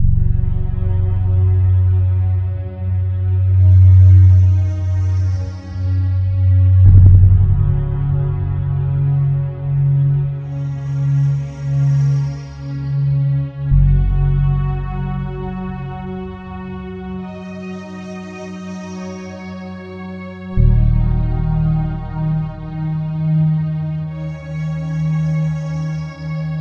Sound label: Ambient